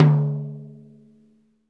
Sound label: TOM TOM HI; Club-50 - Foundations; Ext.Snare